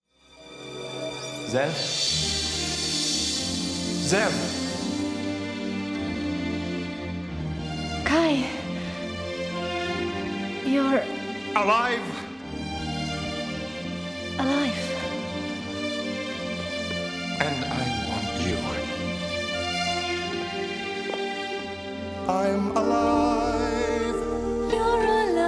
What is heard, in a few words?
Fantasy Dance vocal version